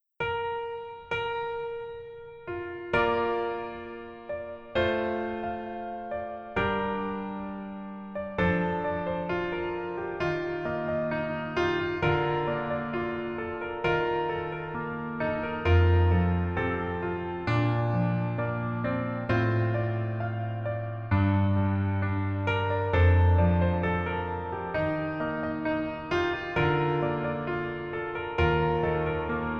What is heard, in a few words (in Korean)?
Piano ver, 멜로디MR, 여자키